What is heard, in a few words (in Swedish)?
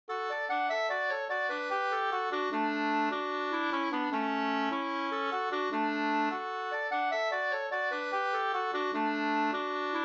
reinlender 4-4